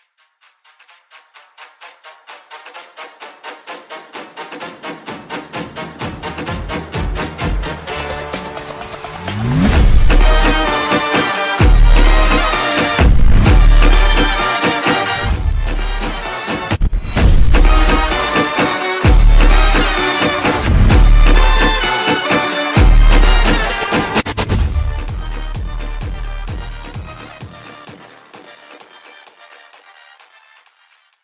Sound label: Mexican ringtone